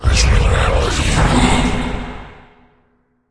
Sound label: orc mage attack, monster